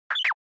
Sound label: Anime Motion Low